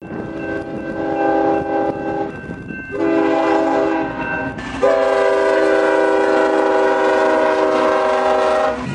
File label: train horn